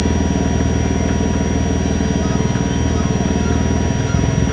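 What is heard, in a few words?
weapon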